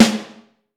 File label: Snare Drum, 5X14; Q Up Arts; Johnny.C's Vintage Snare Drum